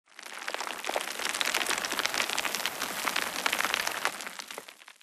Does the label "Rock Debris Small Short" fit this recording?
Yes